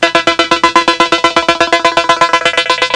phaser intro; Music Intros

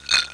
shfflbd, parks; WAV Sound Effects

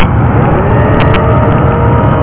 coaster

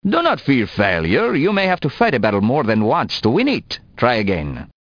lose

